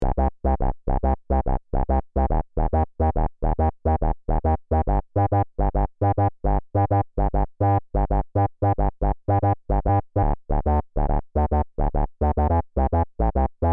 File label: Noise Taoster Arpejiateur